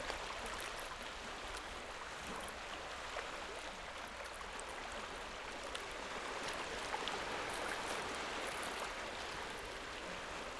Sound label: Gentle waves breaking on the coast; sound ex machina